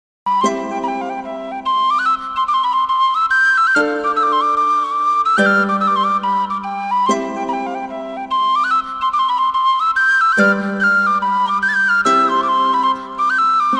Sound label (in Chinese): 纪录片背景音乐